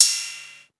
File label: Ride, Cymbals; Vengeance Samples